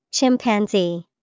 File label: Chimpanzee